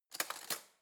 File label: cutlery spoon drawer grab hard